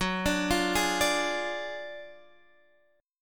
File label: D Diminished F sharp